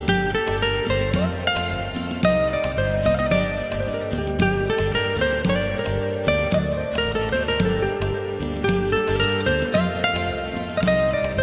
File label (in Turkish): alaturka gitar